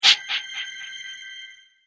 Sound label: Coin Magic, magic sound effects